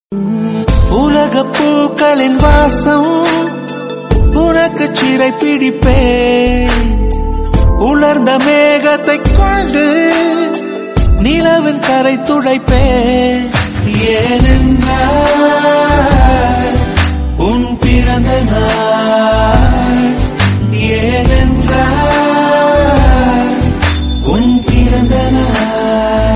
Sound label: Birthday Wishes Tamil; Song Duration:-25 Sec; Happy Birthday